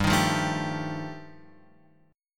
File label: G 7th Suspended 2nd Sharp 5th G chord, Guitar Open F; strummed; JGuitar